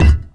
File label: physics cube impact soft